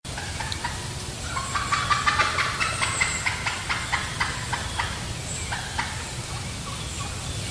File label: turkey cackle; animals